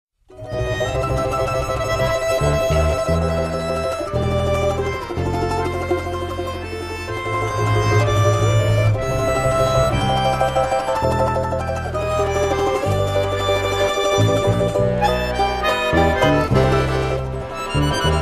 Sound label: banjo; dubrava